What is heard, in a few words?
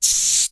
Air swish med